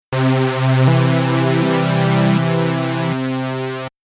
synth poly

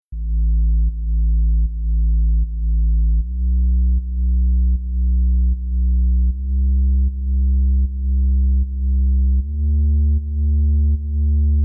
Future Bass Bass

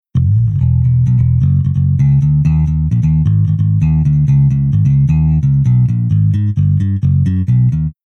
EQ Filter Before

